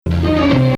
Husseini Low

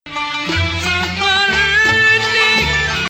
Bayati Rast

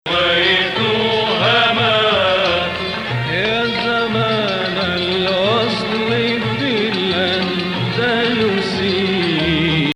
Sikah Jadaka Hijaz

